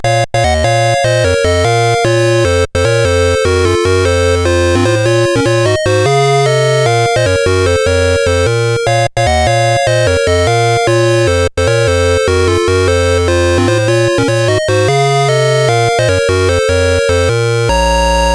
Dragonworld sid CSG8580R5

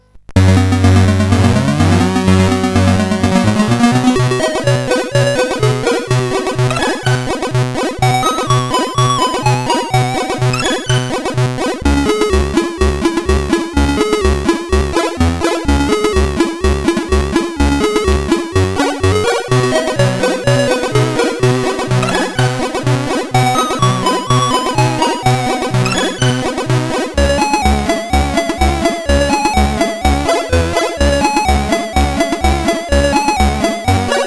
Fast Food, CSG8580R5

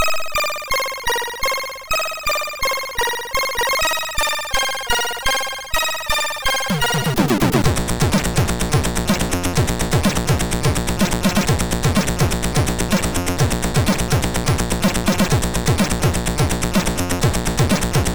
Intro Music II, MOS6581R2

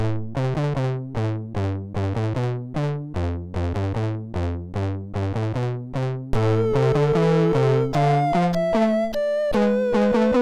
sid MOS6581R3

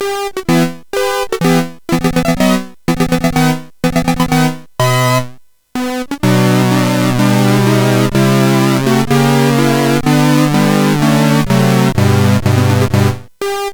sid CSG8580; HVSC; Adagio

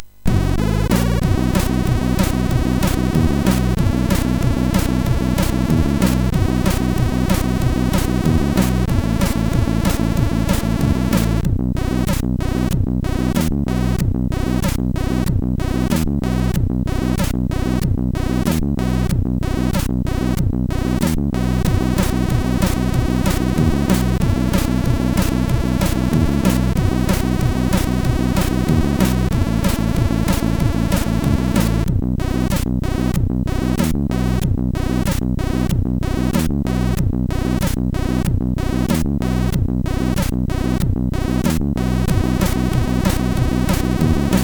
Folk Mix, MOS6581R4; sid

